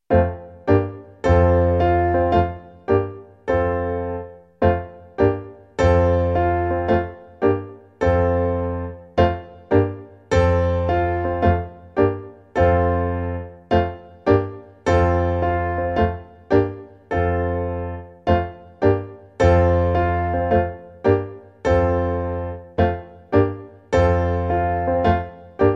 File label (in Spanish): PIANO; Sumer is icumen in